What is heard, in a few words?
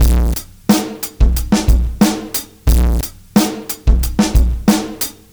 R&B Drums